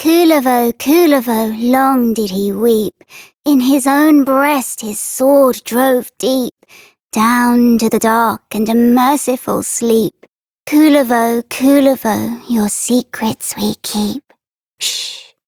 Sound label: Child F, Childrens Rhymes; Warframe; Duviri; Kullervo